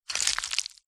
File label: cartoon wet squelch sloppy movement